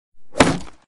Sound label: knife thrust into wall, Medieval Weapons Sound Effects; Fire Weapons Sound Effects